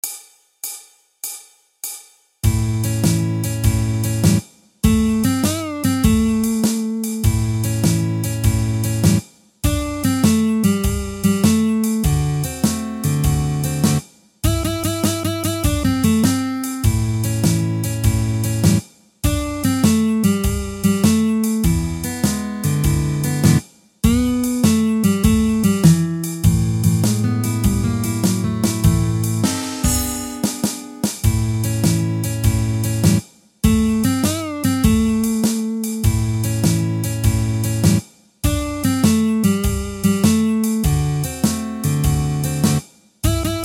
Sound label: Blues Guitar